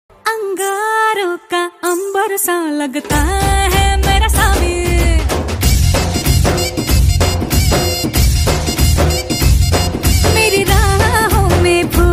song music dance

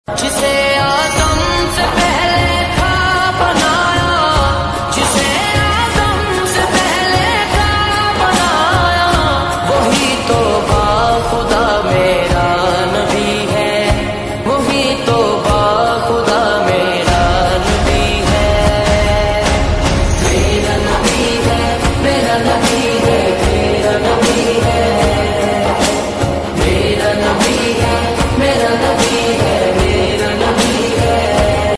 naat ringtone, islamic

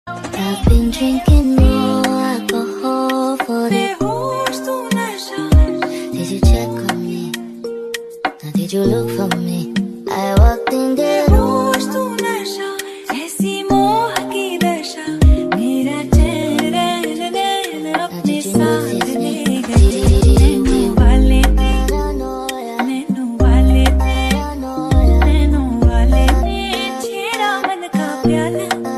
attitude ringtone bgm

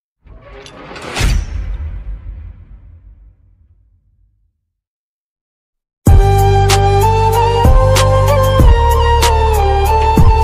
sad ringtone, alone ringtone, love ringtone; mood off ringtone

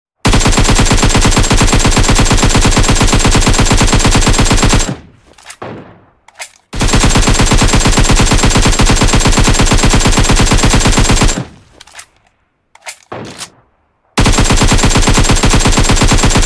akm sound ringtone; pubg

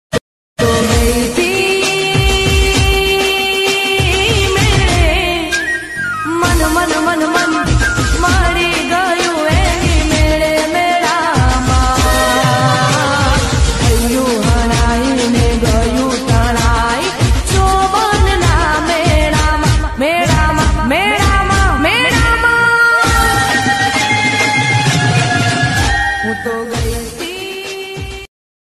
gujarati garba ringtone navratri garba

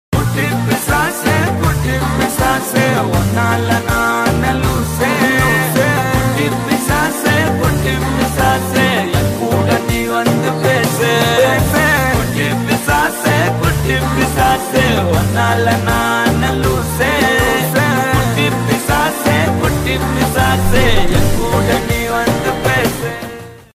bgm ringtone hip pop; pt sir movie; action movie

